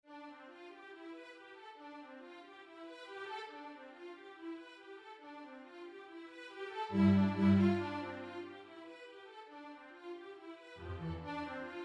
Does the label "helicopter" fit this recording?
No